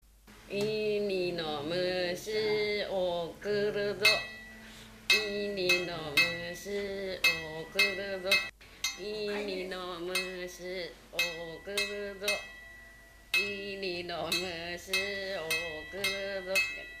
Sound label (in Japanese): mushiokuri